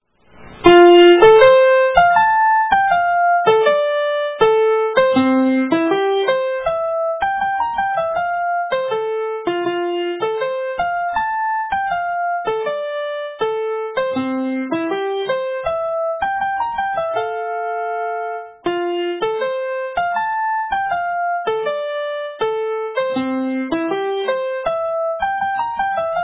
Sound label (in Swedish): polskan